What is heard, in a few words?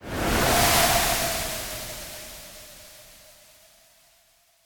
Dis Snow